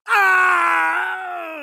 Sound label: impaled scream; mk9; johnny cage